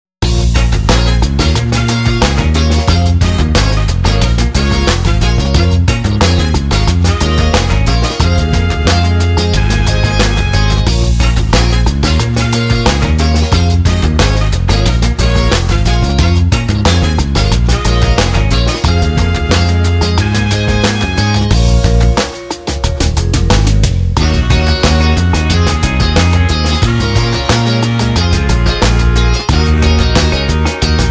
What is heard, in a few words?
AFRO FUNK